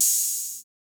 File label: Not Nice Open Hats